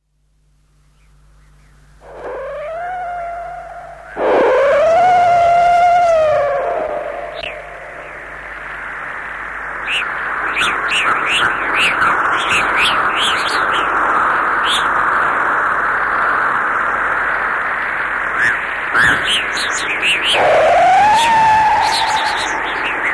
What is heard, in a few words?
Jungle Sounds Roland System 100M